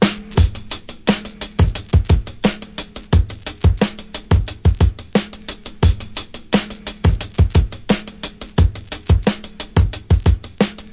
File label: classic brk; VIBRIS CLASSIC BREAKS